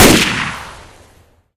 rifle fire incendiary; weapons; gunfire; source engine; left4dead2

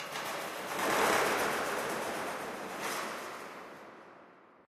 amb wing flap, birds; video games; ambient; nature; source engine; portal2

